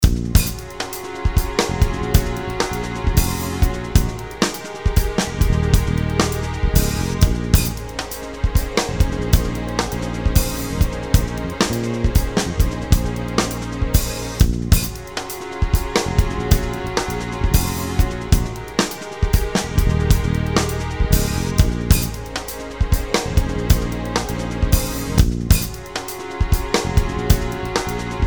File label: Bb Aeolina Pedal; Aeolian Pedal